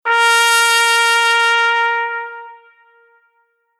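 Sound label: Horn Decrescendo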